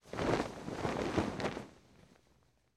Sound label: Flag Flaps Flag Flaps; flags